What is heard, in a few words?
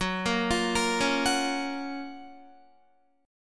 Gb Major 7th Gb down Guitar; GbM7 arpeggiated; JGuitar